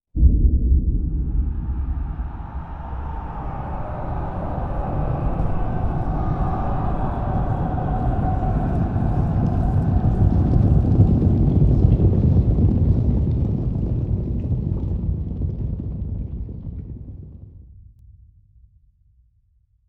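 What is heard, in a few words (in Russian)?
крик с эффектом реверберации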